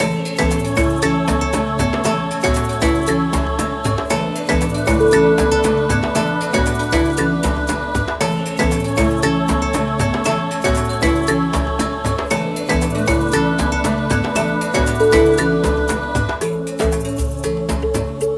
african celebration

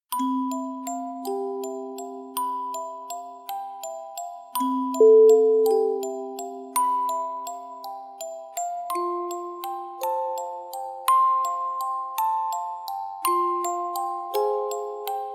peaceful music box lullaby

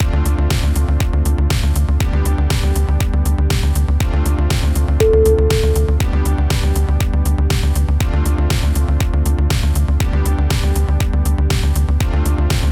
chinese disco

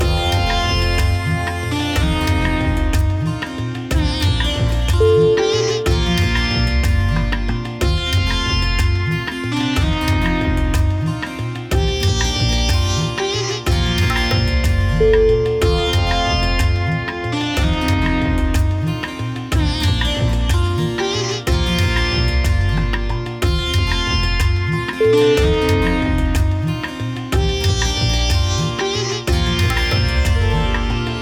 my gentle relaxing sitar

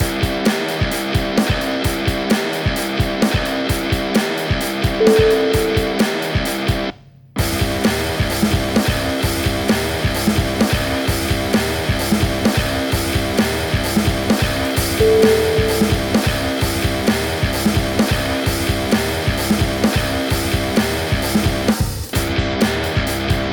rock breakbeat

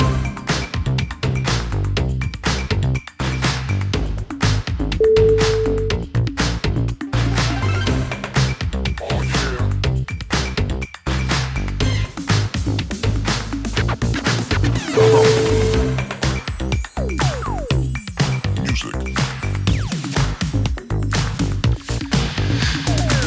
80s robot dancing

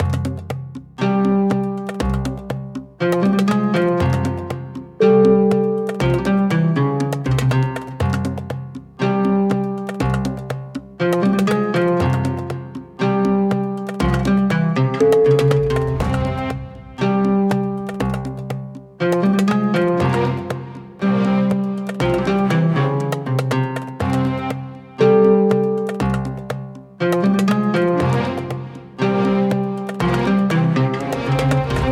arabic cinematic

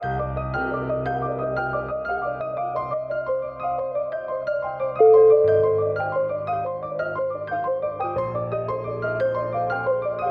frantic piano

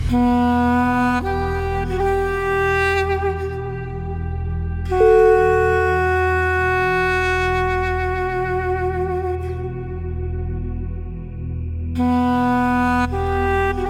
sad duduk

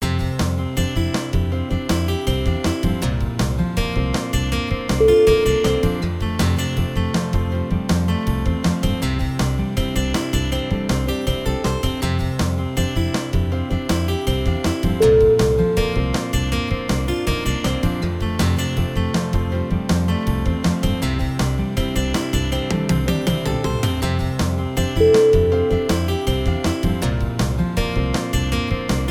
southern comfort

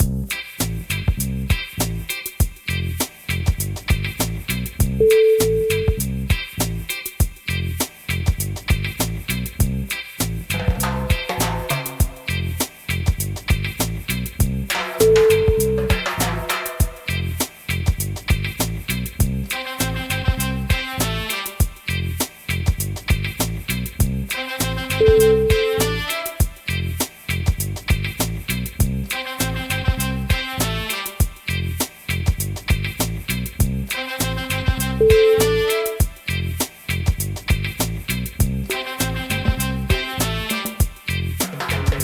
reggae

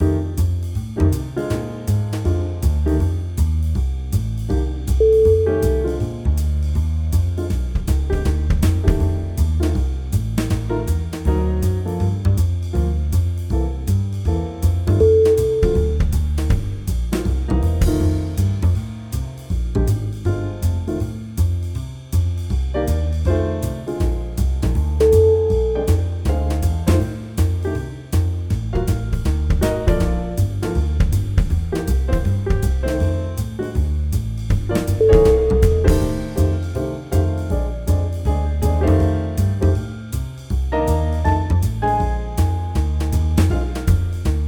bebop blues

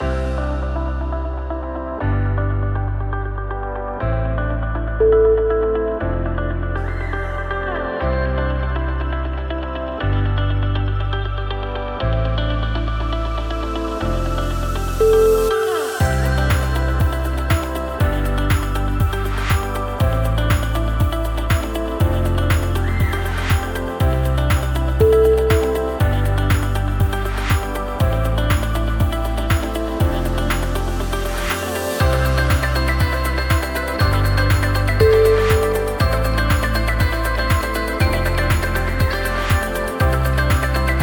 inspiring corporate growing

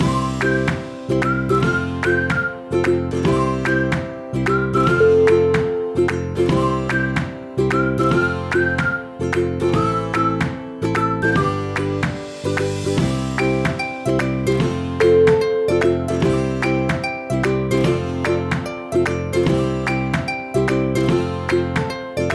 the happy whistle

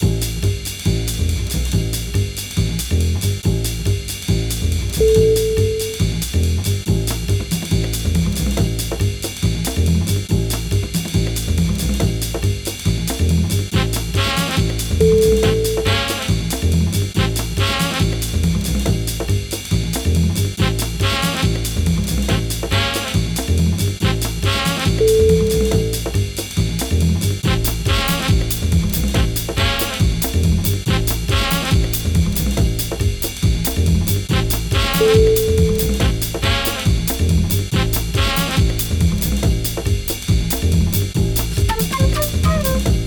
quirky swing

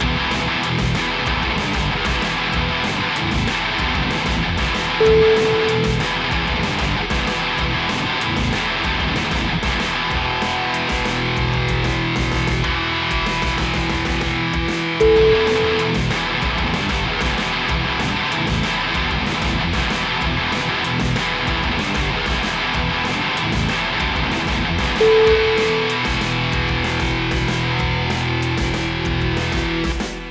metal extreme

